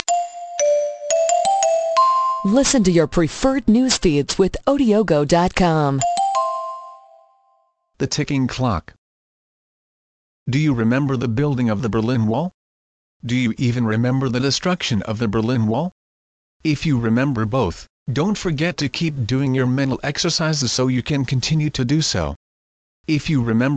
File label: ticking